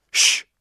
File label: Male Shh